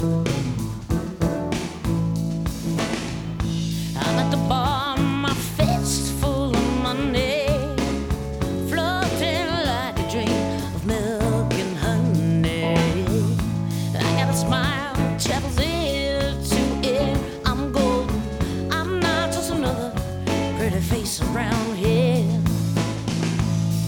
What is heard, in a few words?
too much verb